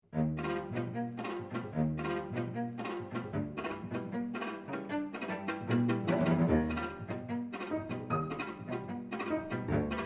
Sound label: Piano Quintet